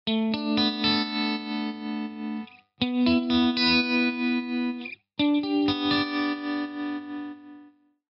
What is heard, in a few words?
Tap tremolo opto, strat simulanalog twin; line6 tonecore